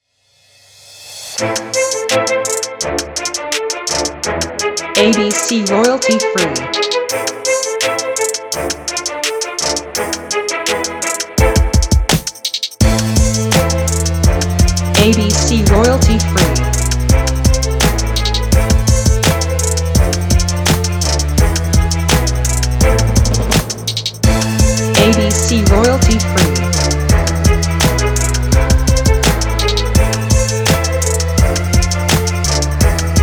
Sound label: Hiphop strings